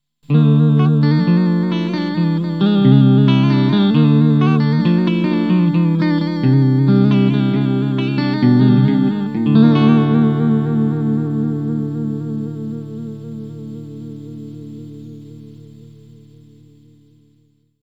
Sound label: zombie vibrato